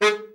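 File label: baritone, BARITON SAX; Best Service; Brass Super Section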